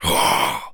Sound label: Jump, Zombies; zps; Carrier